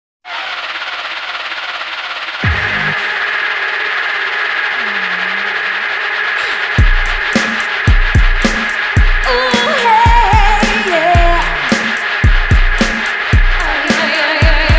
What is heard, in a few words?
Pop Princess vocals; Soundzabound Volume 3